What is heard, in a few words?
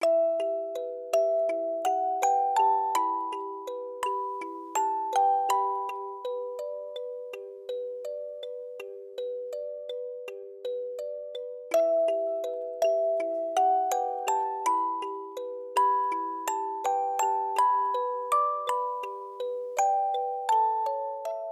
Music Box